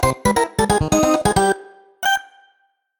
Lose Life; Kirby; Classic Music Collection

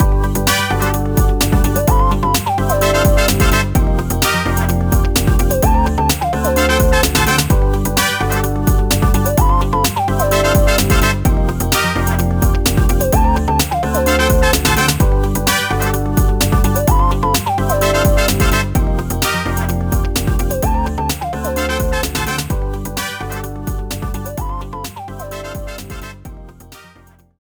BGM Result Win; Clubhouse Games: 51 Worldwide Classics

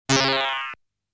Enemy Release Sound; Zero Wing

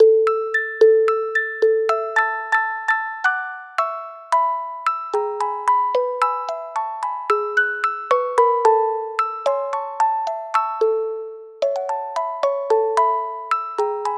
Mambo (Music Box); animal crossing new leaf welcome aamiibo soundtrack

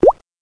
Bubble; Learn to Fly 3

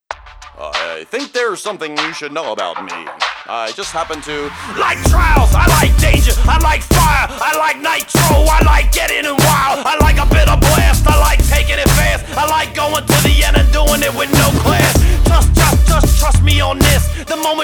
Loader Rap; Trials Evolution Gold

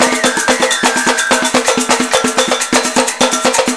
Samba; Kid Pix Studio Deluxe

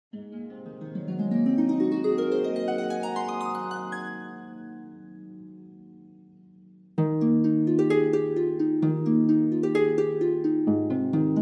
New Character Theme; Swords and Sandals Medieval

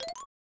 Female Frog; Frogger; SNES

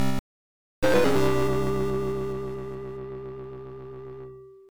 Died by a Trap; prince of persia; pc 98

